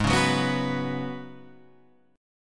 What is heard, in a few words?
F sharp Suspended 4th G sharp; strummed; F#sus4/G#